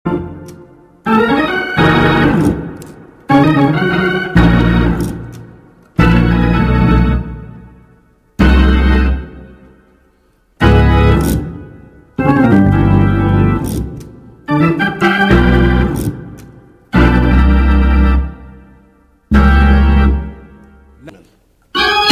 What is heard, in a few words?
organ shout